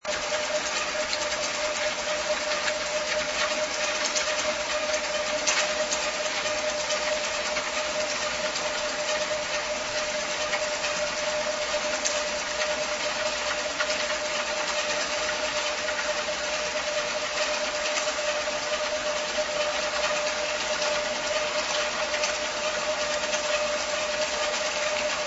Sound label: squeaky ship